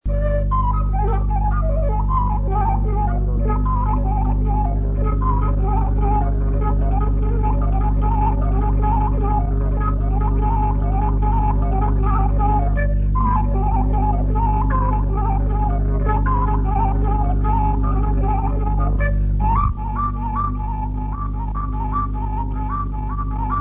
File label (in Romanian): Batraneste II la fluier; jocuri si cantece batranesti; Vaslui